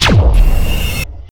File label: laser; weapons